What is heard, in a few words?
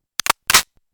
Carbon fiber